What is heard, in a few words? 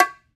E BONGO, World Percussion